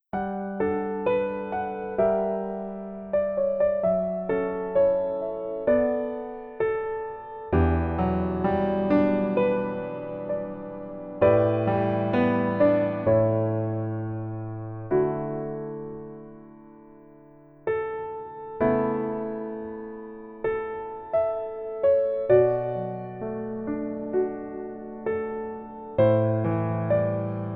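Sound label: Piano version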